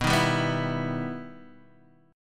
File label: B Major Flat 6th; strummed